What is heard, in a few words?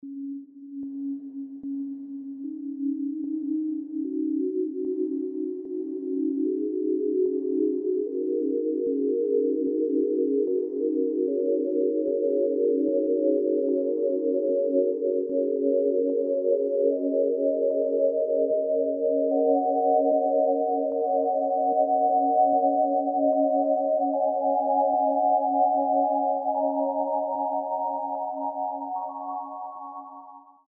Ambient; AudioSoul